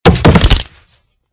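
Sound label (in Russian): бросок на деревянный пол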